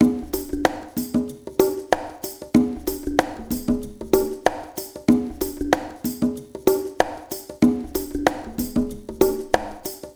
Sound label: AF SHUFFL PRC MX, Liquid Grooves; Spectrasonics